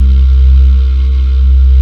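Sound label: organ vox C2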